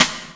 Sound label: sticks 4/4, metronome